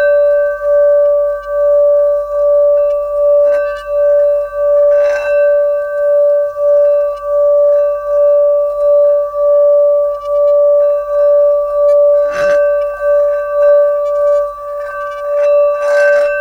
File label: Singing Bowl; Sonic Foundry (Sony Creative Software); Continental Drift Aftershocks